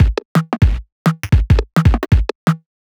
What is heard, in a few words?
170 Drum Loop